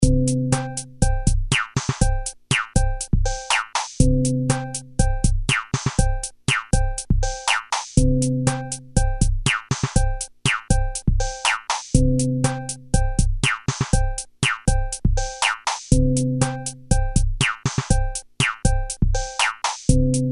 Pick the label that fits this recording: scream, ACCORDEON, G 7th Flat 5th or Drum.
Drum